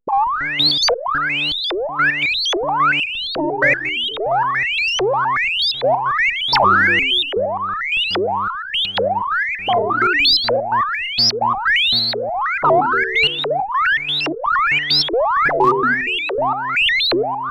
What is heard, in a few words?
High Reso Chaos; Super Gemini